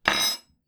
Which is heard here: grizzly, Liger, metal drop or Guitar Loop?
metal drop